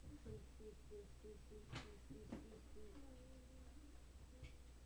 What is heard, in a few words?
glass